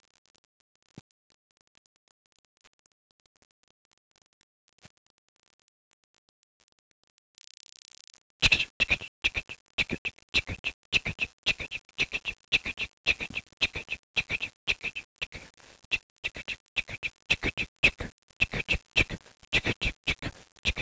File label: remix Drums